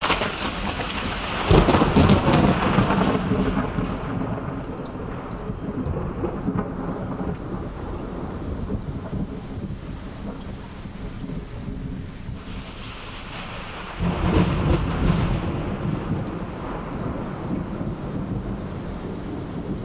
forest thunderstorm

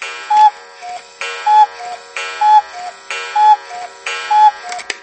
Cuckoo clock sound

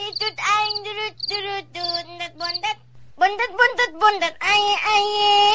Dududu

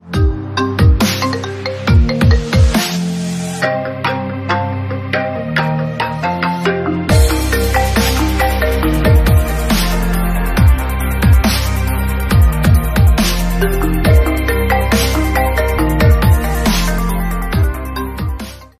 iPhone 11 Pro Max

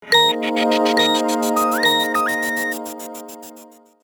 nokia mensaje

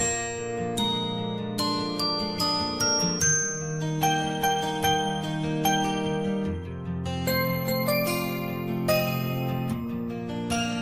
nice alarm

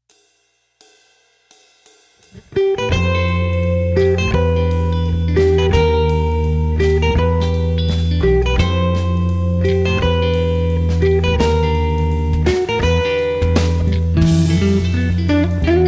jazz with feeling

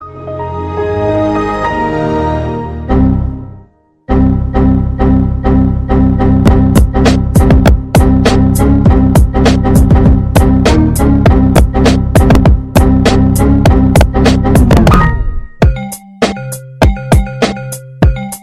computer remix

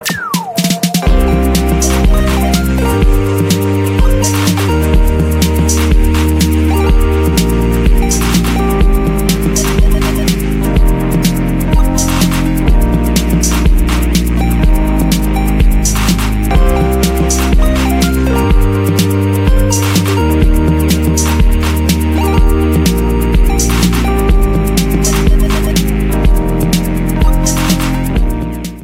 Nokia Lumia 620

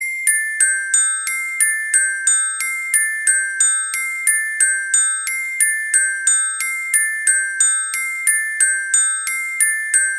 ring of bells

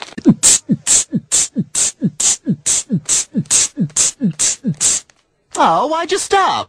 beat drop

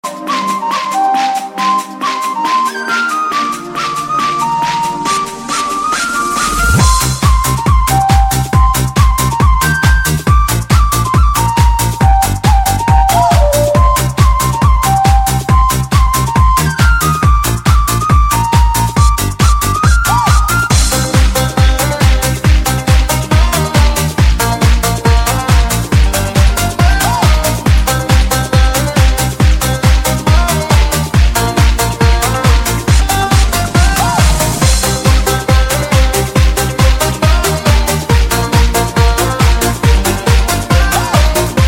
rhythm flute dance

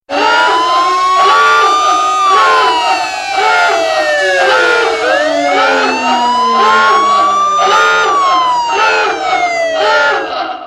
urgence alarm clock

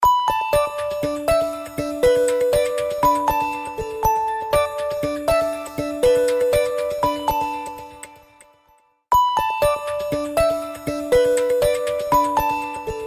samsung galaxy s6 splash

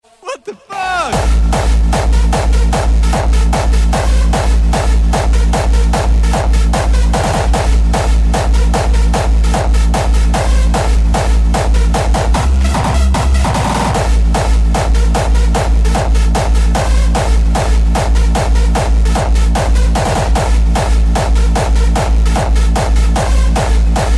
hardstyle

